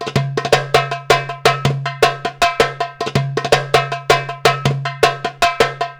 PERC LP, Arabian Traditions

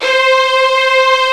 HALL STRINGS, EMU; Rubber Chicken Software Sample Bank

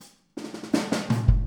Boogaloo Kick, 82 BPM; Sonic Foundry (Sony Creative Software); Drums from the Big Room The Mixes

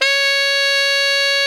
TENOR MF C#5, tenor sax; Greytsounds; Philip Wolfe David Crozier's Saxophones

